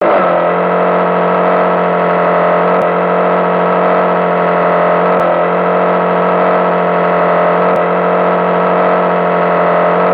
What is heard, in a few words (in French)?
VIEILLE BARQUE MOTEUR